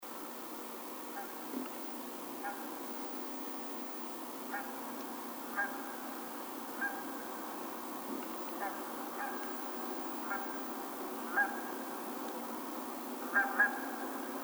Canada Goose

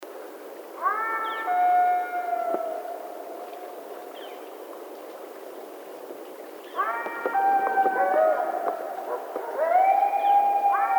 Colorado Howl, bigfoot

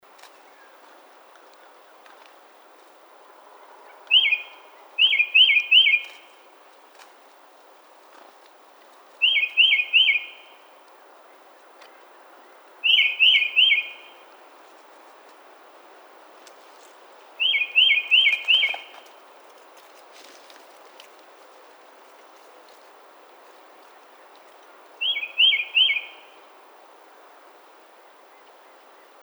Tufted Titmouse

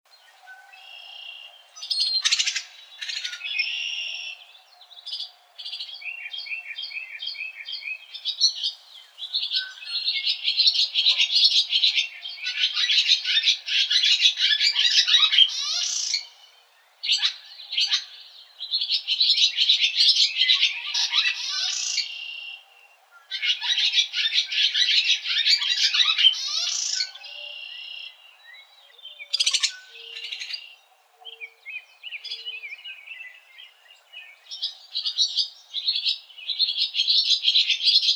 Barn Swallow

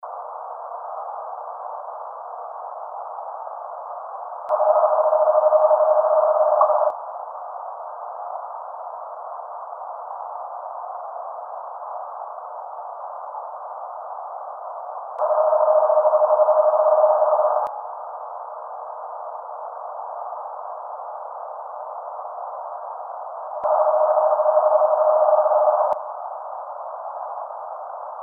Eastern Screech Owl

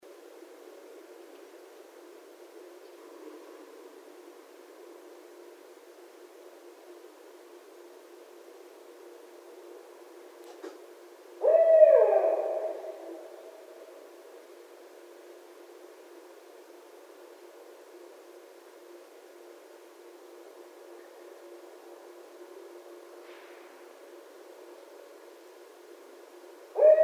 Barred Owl

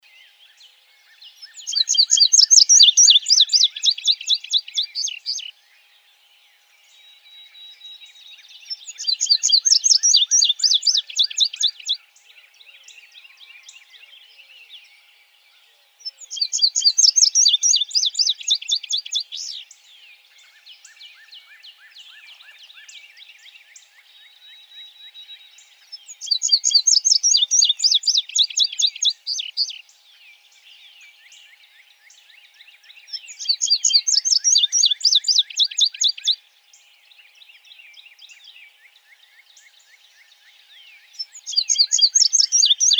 Indigo Bunting

